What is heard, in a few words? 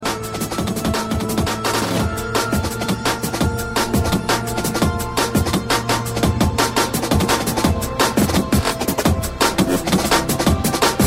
Drum Melody